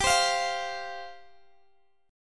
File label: G 7th Suspended 4th G, Guitar Open G; down